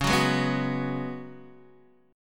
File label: Db 7th Suspended 4th Db down Guitar Open D